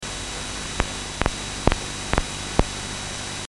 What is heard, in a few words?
signal